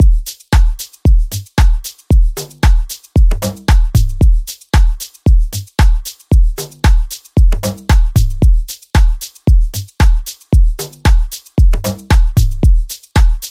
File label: Saxophone